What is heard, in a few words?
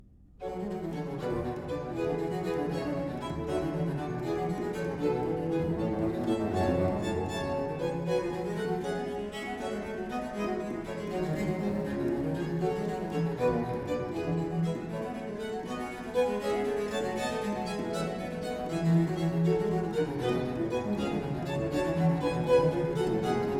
Sonata in G minor - Vivace; Henry Purcell; Ten Sonatas in Four Parts; Sonata VIII